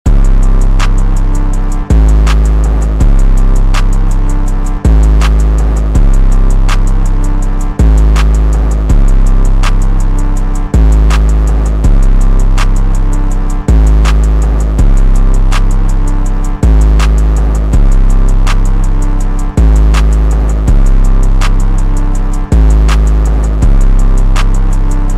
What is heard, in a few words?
Instrumental